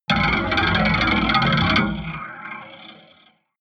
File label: rattle noise, sciene fiction